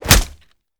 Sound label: punch gun player; gmod; arccw uc commons attachments